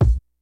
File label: KICKS; Kid Nepro; Elite; KICKS SNARES